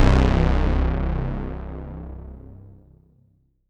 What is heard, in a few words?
C2, Synth Line; 90 s Sample CDs; NiceBeats; SynthLine VOL-23